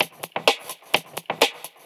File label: FX Loop 128 BPM, House